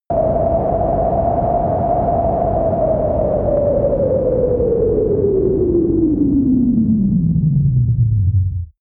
eerie wind fall plane

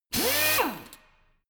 impactor high; maschinen; bohrer

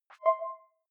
click big bubble high

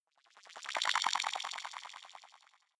sci-fi subterranean light up; swooshes-swishes

